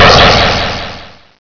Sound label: hit